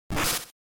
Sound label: GB Action Damage